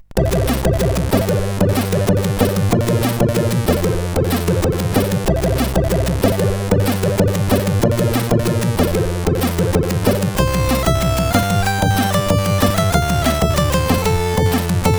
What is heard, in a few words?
tune sid CSG8580R5; Lame Over tune 03